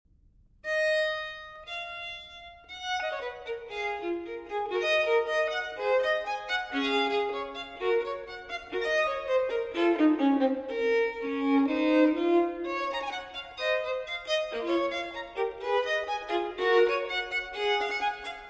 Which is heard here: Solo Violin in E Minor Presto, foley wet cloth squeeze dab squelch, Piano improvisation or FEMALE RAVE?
Solo Violin in E Minor Presto